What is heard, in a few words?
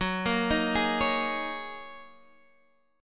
F sharp 6th Add 9th, down, Guitar, Standard; arpeggiated; JGuitar